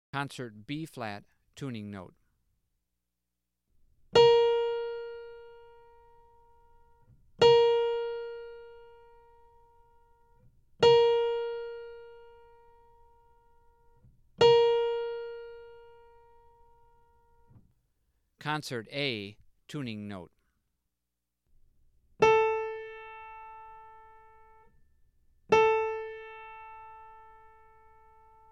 Tuning Notes; James „Jamey“ Aebersold Play-Alongs

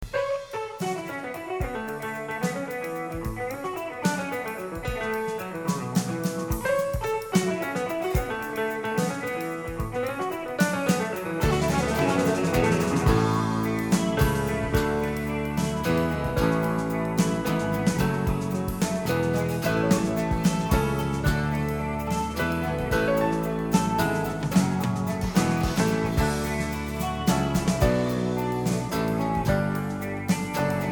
Mountain Waltz

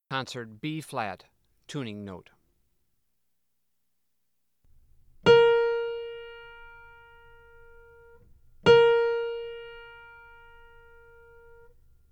Tuning Notes; James „Jamey“ Aebersold Play-Alongs

